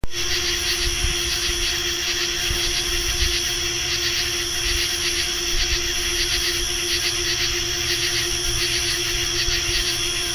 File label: Windmill